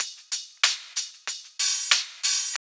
TRIP 90, trip loop

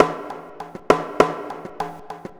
Baladi al Maqlub Bendir, Acoustic Beats; Sonic Foundry (Sony Creative Software); Myths of Technology

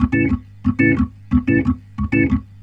Roots Reggae, B-3; Sounds Good - Roots Reggae

